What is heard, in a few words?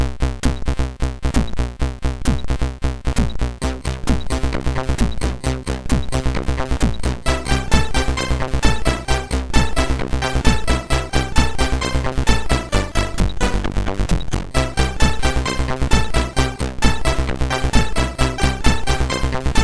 sid, MOS6581R4; Whitemen 4; hvsc